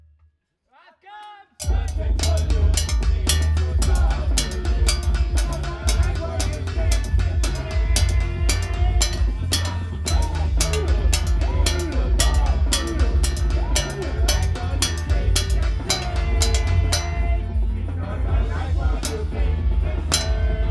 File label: perc vox